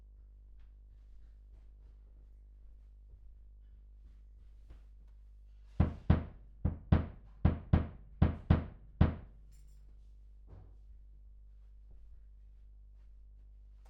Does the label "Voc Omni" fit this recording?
Yes